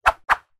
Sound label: stick twig swish air fast x2; zapsplat